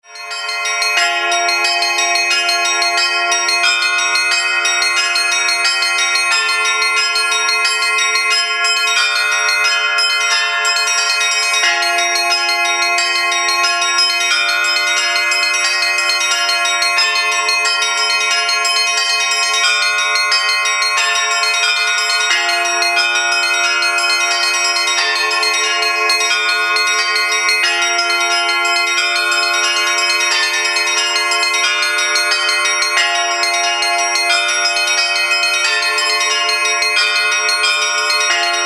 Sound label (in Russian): zvon